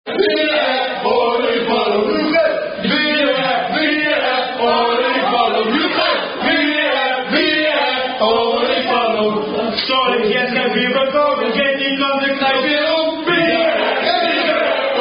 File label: Unknown Dutch Rugby Song